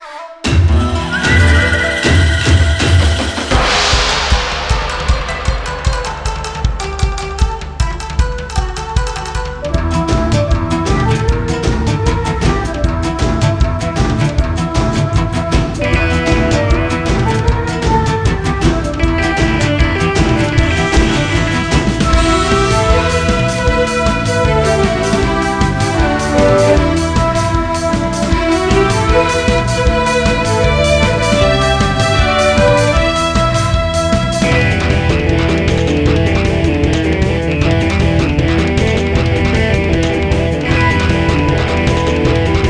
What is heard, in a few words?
bgm boss; Shuriken Sentai Ninninger Game de Wasshoi; 3DS